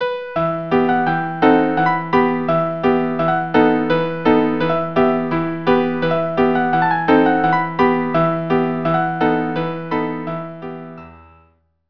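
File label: violin piano piano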